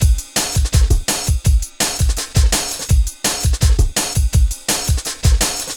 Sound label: programmed stepz, drum loops 166.5